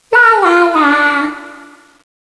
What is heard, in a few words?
appear; CSO LightZombie